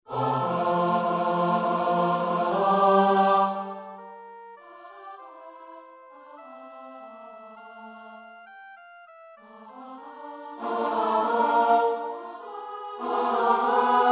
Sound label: Choir All